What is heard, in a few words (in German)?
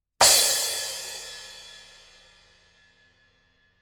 becken